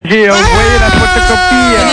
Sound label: ooooh